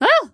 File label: Yelp; Banker Spa